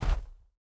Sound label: bear footstep snow hard; Rust